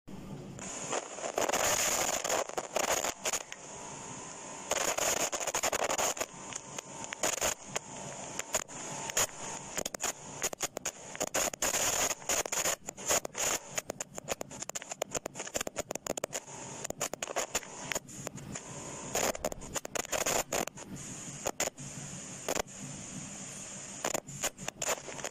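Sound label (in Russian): шумы в микрофоне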